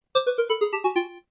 game over

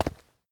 stone, footsteps; ufoai

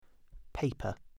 paper

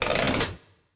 wibbly lever; tardis2010; garrysmod

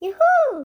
correct; literki dzwieki

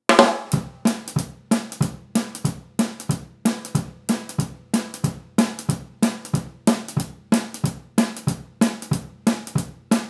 Overhead left Drums

